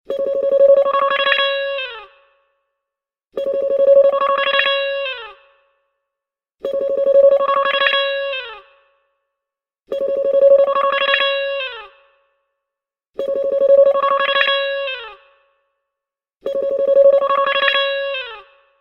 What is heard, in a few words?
Guitar beep, beep guitar